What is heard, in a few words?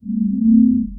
a faint ethereal hum, cursor